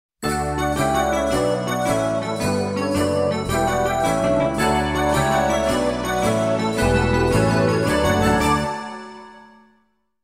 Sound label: Snowbells; Focus Music